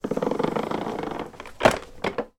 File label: household hoover spirit vacuum cleaner push along floor while off